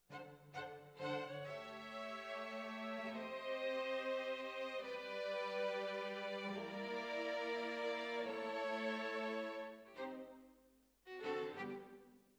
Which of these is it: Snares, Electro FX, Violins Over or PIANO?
Violins Over